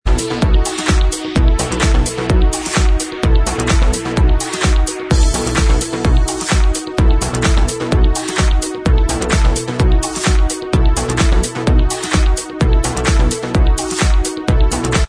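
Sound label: remix instrumental track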